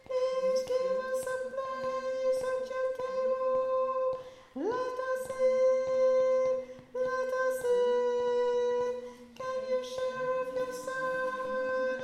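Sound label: Chorus Tenor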